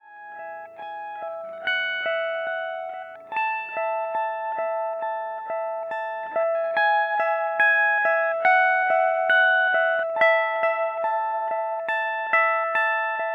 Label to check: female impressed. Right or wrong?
Wrong